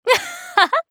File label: laugh; Eternal Return; Hisui; JPN